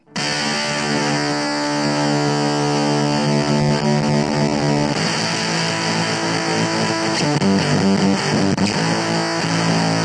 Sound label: fuzz